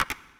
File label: click; musicbox